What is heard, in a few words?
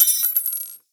Belt Fed Rifle Links C; Warframe; Tenno; Frumentarius